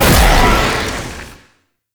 Void Launch, Weapons; Duviri; Incarnon Adapters

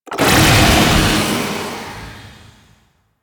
Blink Cast; DE; Warframe; Weapons; Archwing; Powers

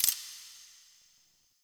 Choice Hover B; DE; Warframe; Winematic

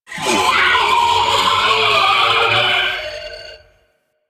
Sentient Ghost Caster Attack, Barks; Warframe; DE; Sentients; Sentient Eidolon Ghost Caster

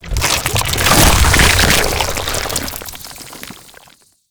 Infested Bone Blade Unburrow; Warframe

